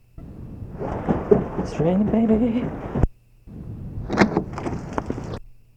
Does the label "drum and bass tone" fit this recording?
No